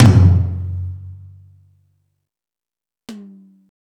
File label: Toms, Drum Machines E-MU Pro-Cussion